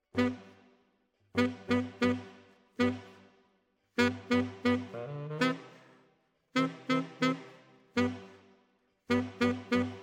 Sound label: Alto, RNR for piano